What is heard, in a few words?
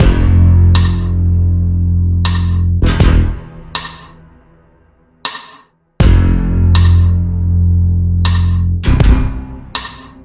Instrumental